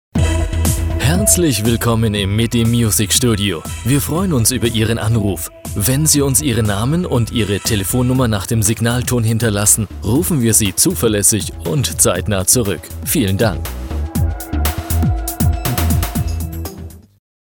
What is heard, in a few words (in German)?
Anrufbeantworter Midi Music